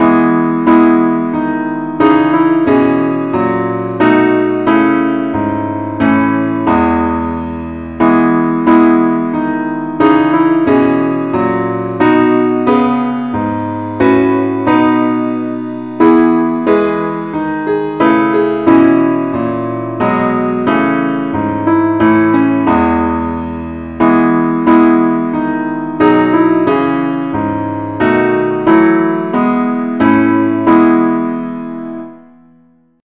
parts only key E

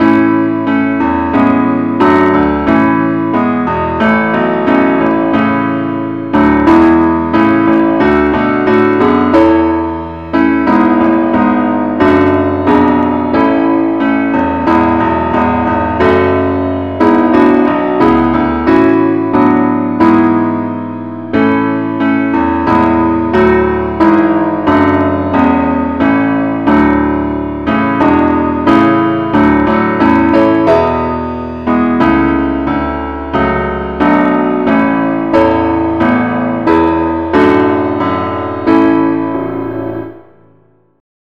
keyboard only, key a; christe Sanctorum

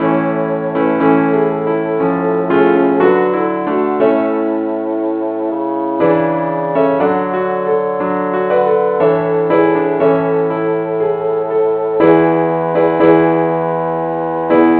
keyboard key D

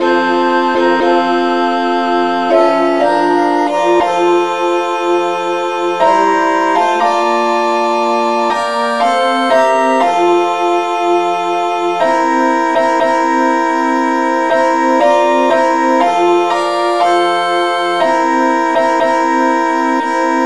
satb keyb

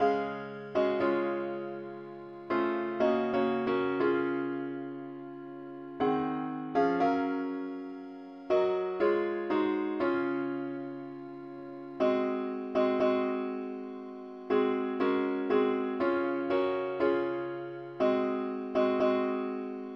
parts only key des

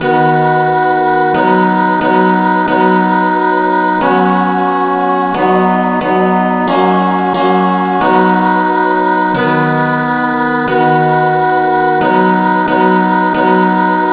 rev no vocal key b

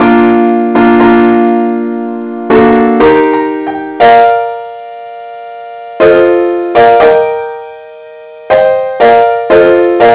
compare Chords

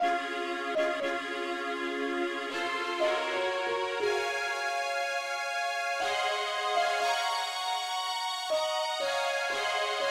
uke lead, key des; pilot

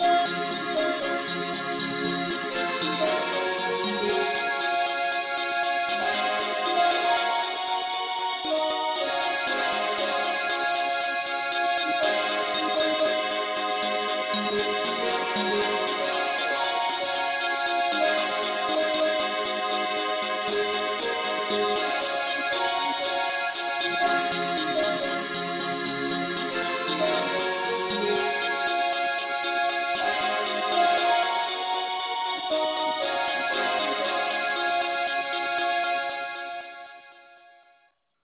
mandolin Lead key cis

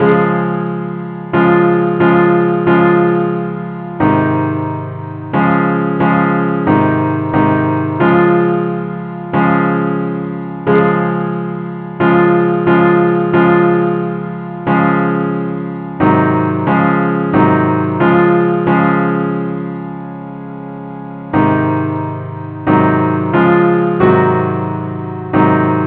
simple acc key cis

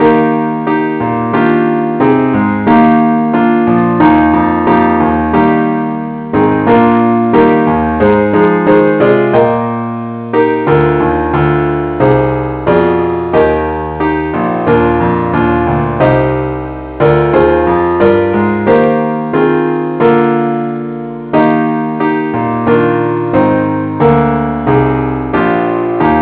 keyboard only key ees; christe Sanctorum

